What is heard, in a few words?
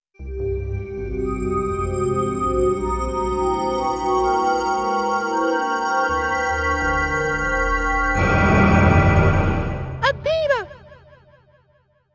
JINGLE Time Up